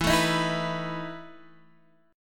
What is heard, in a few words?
E Major 7th Suspended 4th E down Guitar Open D